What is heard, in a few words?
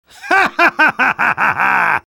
spy laugh evil; vo